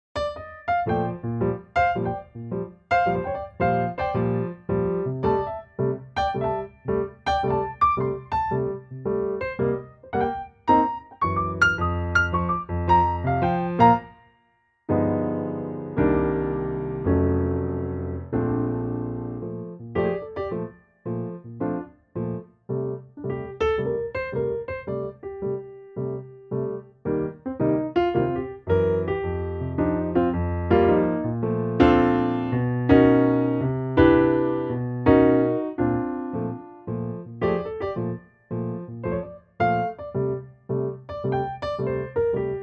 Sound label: Bb piano